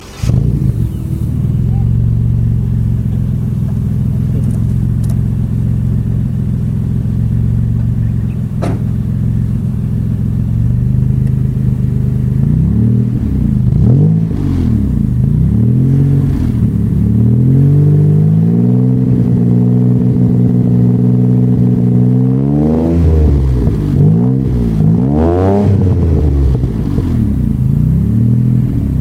Wrx With Big Exhaust

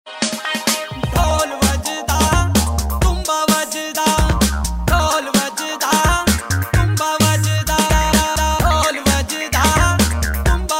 Dhol

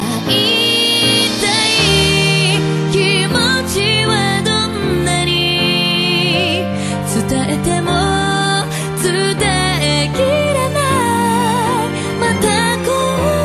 Moon Crying

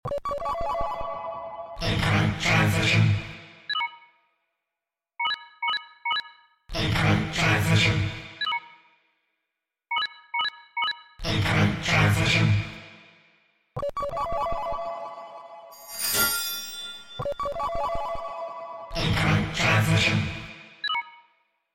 Incoming Transmission

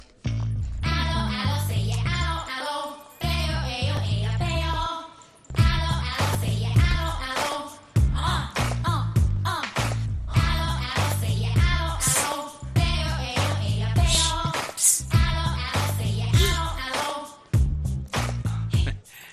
Music; Thupakki

